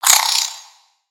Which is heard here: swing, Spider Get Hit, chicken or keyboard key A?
Spider Get Hit